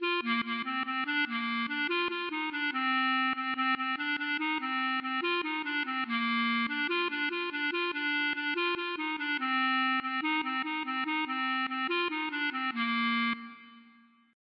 klezmer

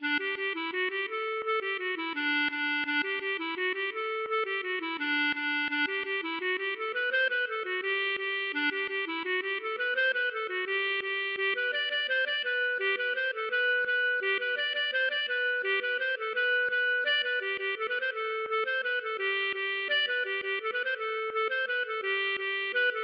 gavotte; Montagne Suite 2

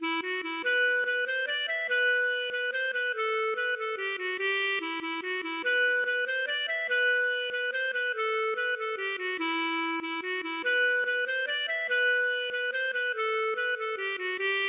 mazurka

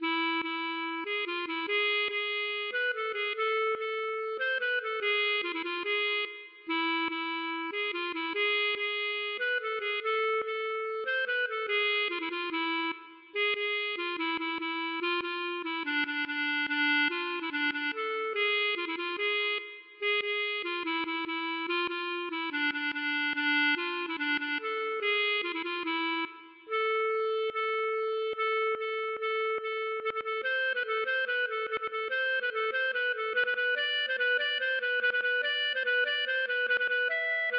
klezmer

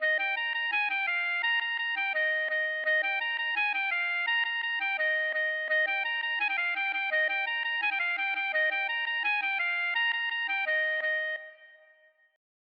gavotte; Podou Fer

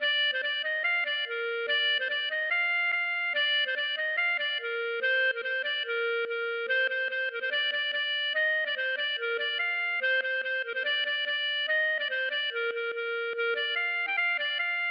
gavotte; Aven BB

